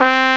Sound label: trumpet 60; sc88pro; tumbledemerald